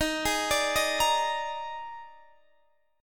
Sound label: Eb Major 7th Suspended 4th, Guitar Open D; down